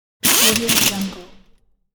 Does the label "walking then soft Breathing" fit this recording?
No